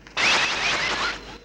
stun

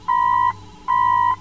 tones

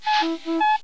extra beep

